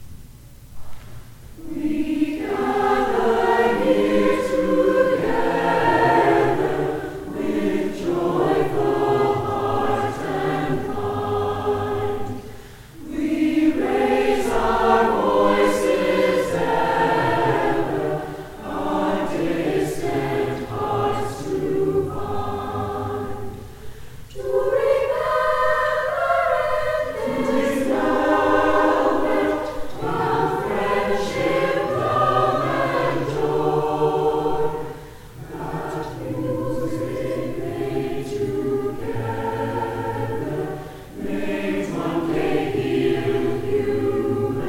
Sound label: Chorus